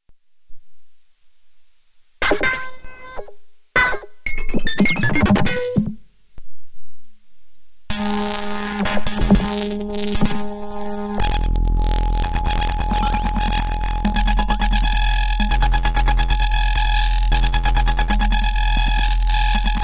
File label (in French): improvisation, synthetiseur; A100